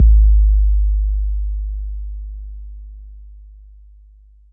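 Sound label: bass drum 808 Smooth B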